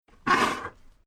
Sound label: Animal Cow Beast Coughing Monster; Nature